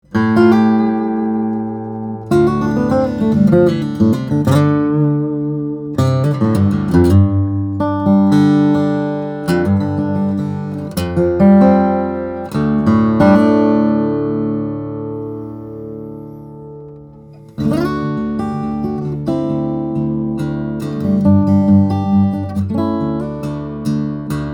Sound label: OM, steel string guitars flattop; brazilian rosewood spruce; Kim Walker; OM-A special